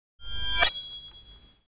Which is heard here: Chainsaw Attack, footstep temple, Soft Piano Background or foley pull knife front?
foley pull knife front